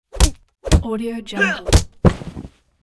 martial arts punch fall with vocals; hollywoodedge

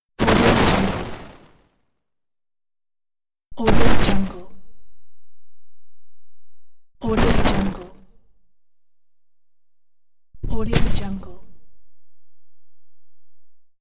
smash rock ingame

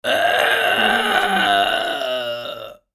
torture pain shout

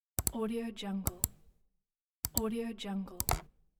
mouse click; moonaudioproduction

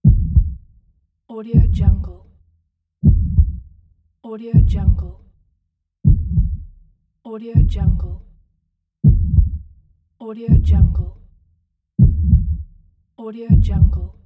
heartbeat normal

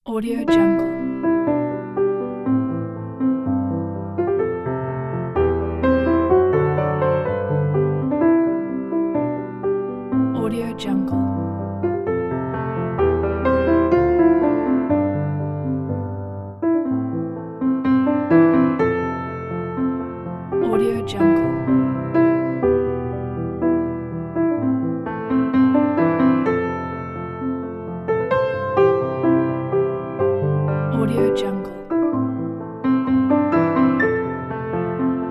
sweet uplifting piano

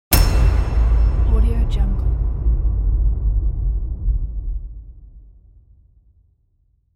cinematic trailer drums

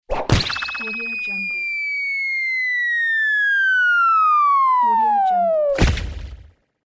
cartoon falling

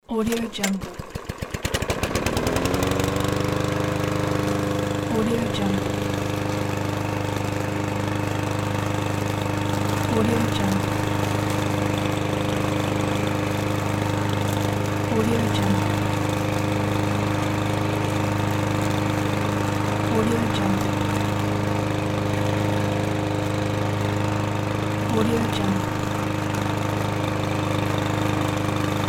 lawn mower; kazoomx

